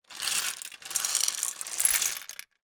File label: Gumball machine, metal, glass, candy, filp, jostle, clatter, 3 moves, wide; Eiravaein Works; Vaeyan IV